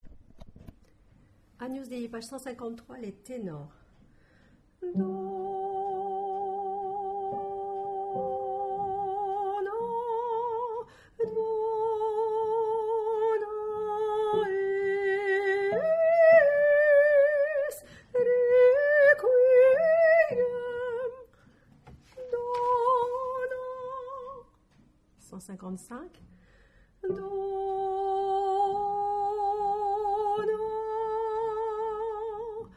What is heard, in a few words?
Tenor; agnus dei